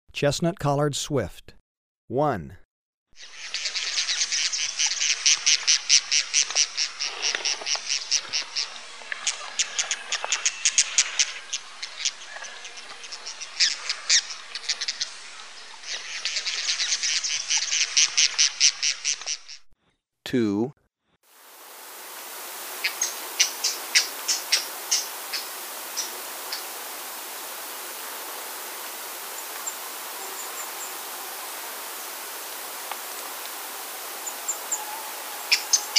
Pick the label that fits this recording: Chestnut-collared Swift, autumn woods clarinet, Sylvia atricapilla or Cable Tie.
Chestnut-collared Swift